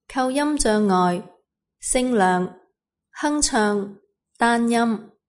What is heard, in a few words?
humming monosyllable; aphasia